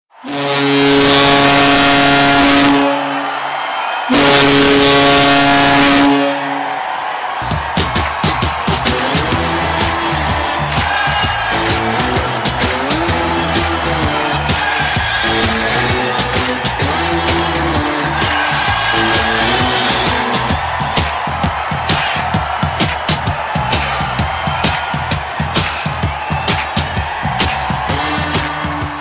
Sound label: Goal Horn